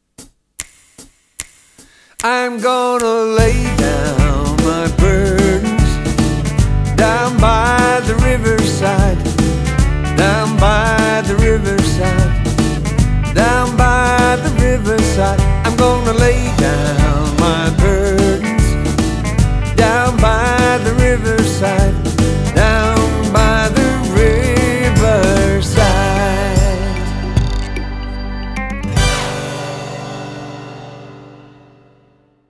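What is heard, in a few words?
Down By The Riverside, ukulele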